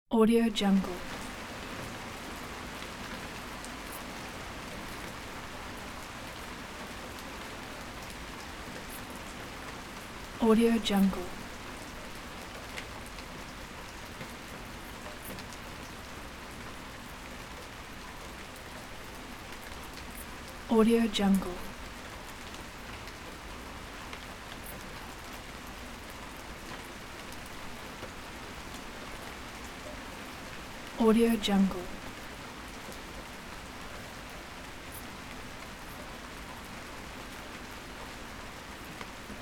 fading summer rain; صدای باران آرام تابست; nature sounds

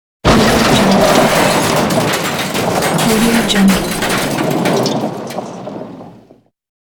crash effect; تصادف شدید خودرو; urban sounds

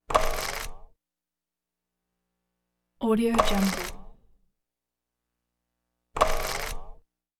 alarm clock springing twang light thudding; cartoon

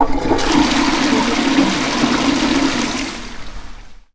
dirty public bathroom toilet flush; domestic sounds

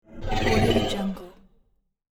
monster breathing roar; human sounds

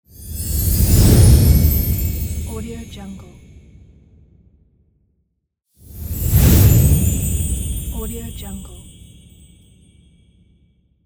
chimes whoosh; transitions and movement

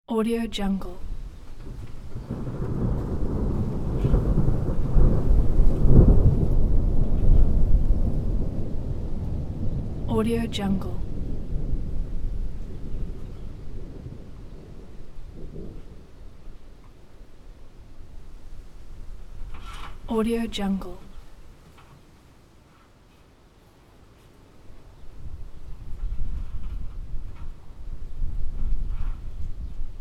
spring storm approaching thunders; نزدیک شدن رعد و برق

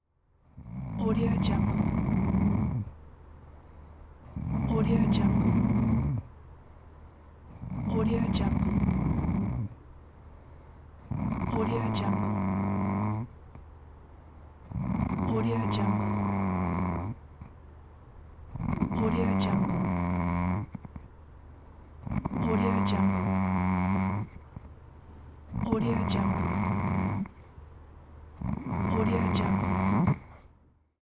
sleeping woman snoring; خر و پف یک زن در خواب; human sounds

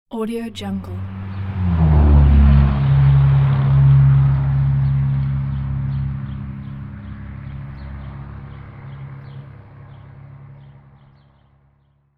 dodge car distant drive by; urban sounds

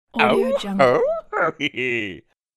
psycho male laugh; خنده مرد روانی; human sounds

